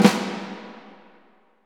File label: SNR, Orch Snares; Roland LCDP03 Orchestral Perc